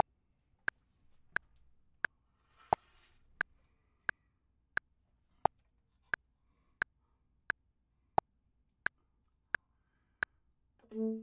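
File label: bottom, Saxophone and Clarinet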